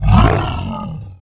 hurt; mahlendur

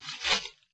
sword undraw

